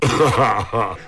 ah ha ha, laugh; ooh ahh

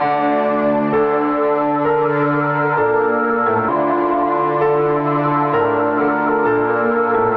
something wrong, Electronic Loops

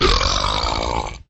burp medium

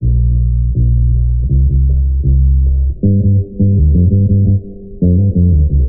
bass funk, Loops Phonky

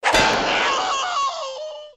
cartoon pain; Crash Bang Boom

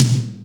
flam, Toms; Real

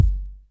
Kicks Soft

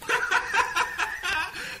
laugh

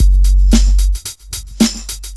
Drum loops, Electro

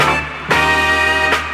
Stabs

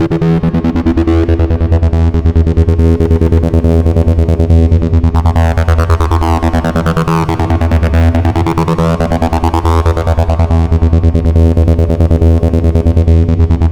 acid days, Electronic Loops

